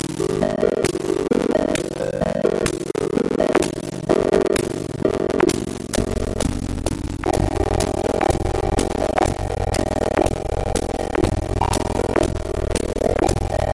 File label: midi holiday country